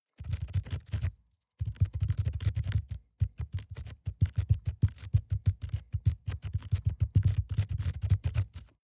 rat run trample fickle

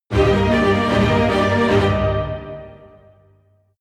jingle orchestra dramatic

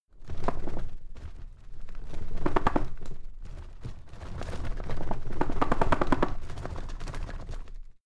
drawer cabinet paper rattle long

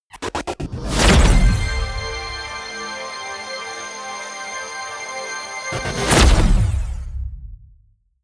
promo pad

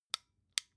flashlight on slow

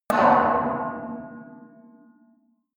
metal impact bar bell large

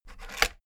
rotary control plastic rattle turn back

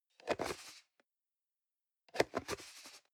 plastic can small lid screw single close

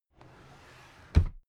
drawer cabinet carton close regular

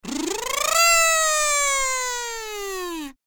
kazoo

